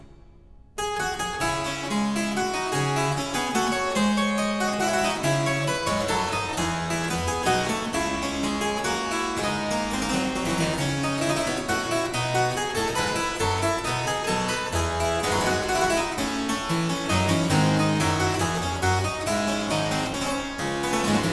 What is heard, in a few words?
Suite in D Minor Courante, Harpsichord; ed. E. Roger, 1710